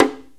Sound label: Surdo Mute; Giga Samples Collection; Orchestra